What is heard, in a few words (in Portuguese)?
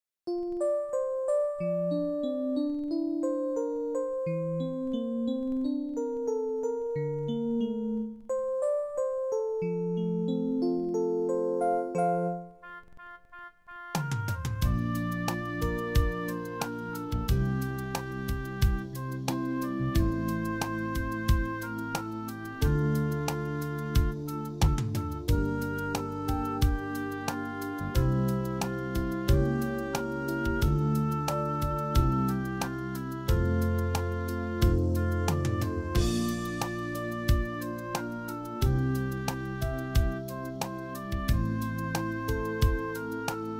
Flauta de Bisel; Menina estás à janela